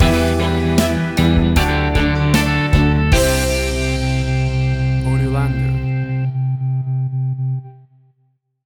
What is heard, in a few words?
Anthemic Pop Rock Short Stinger; AUDIOLANDER